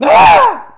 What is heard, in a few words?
Scream male